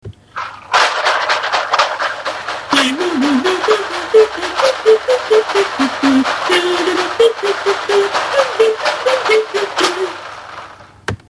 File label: maracas